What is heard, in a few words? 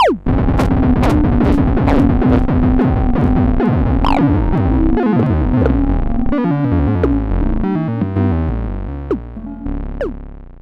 FX, 5U Modular